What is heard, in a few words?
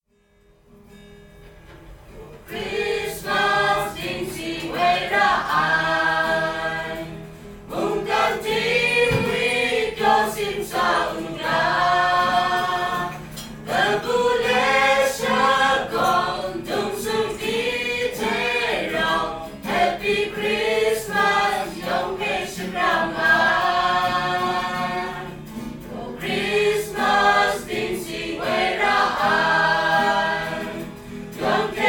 kachin xmas